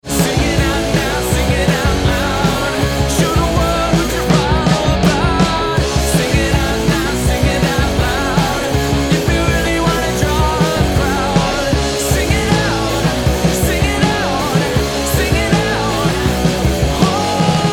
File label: POP LIVE